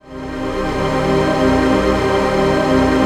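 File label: trance pad; Best Service; XX Large - Pads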